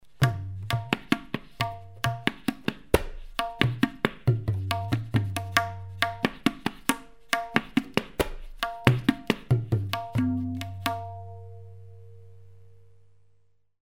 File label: Tabla solo